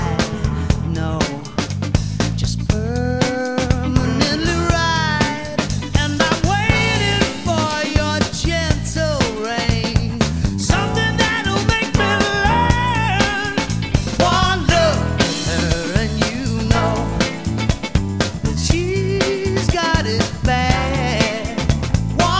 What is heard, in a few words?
rock